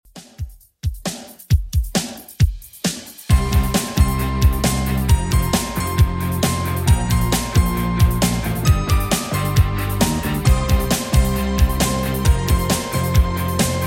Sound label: 80s 134 bpm; Redrum